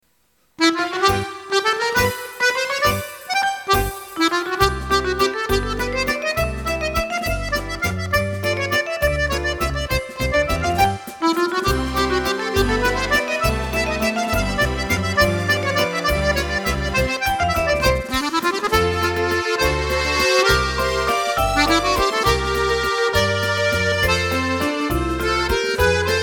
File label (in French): accordeon musette